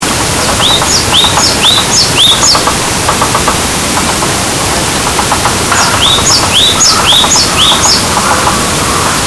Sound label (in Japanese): kitutuki